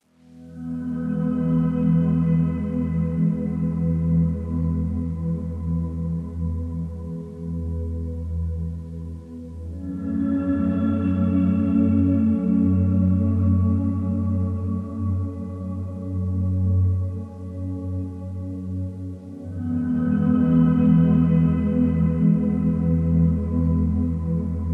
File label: Soothing Harmony